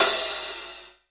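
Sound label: electronic hi-hat; Best Service; Hyper Dance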